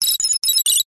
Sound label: science fiction computer beeps high pitched response